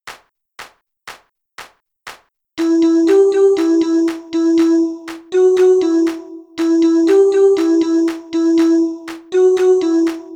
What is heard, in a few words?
boucle